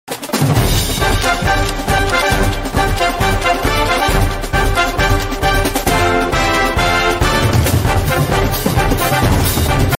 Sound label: music; grug